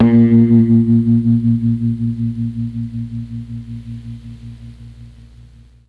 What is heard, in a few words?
A#1 GUITAR, Mellotron MKII; Mike Pinder - Mellotron Archive CD